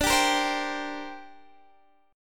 D 7th Suspended 4th Sharp 5th, down, Guitar, DADGAD